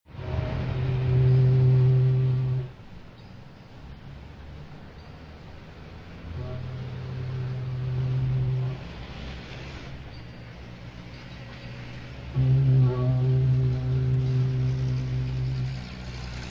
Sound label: A Duet - Whistle And Bamboo Pipe; Queen Elizabeth Whistle